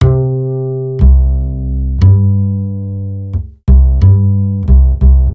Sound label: Acoustic, Лёгкая музыка; Бас сэмплы (Легкая музыка)